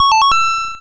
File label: notification